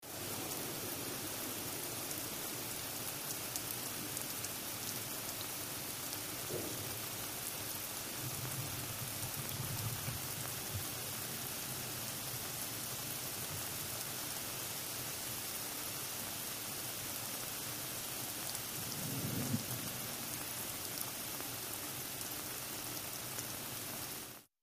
Gentle Rain Shower

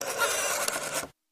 Door Outer Open, Damper, Wavering

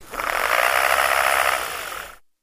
Motor, Small; Low Speed, Start, Purr, Stop

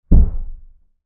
Footsteps; Single Female Stomp, From Upstairs.

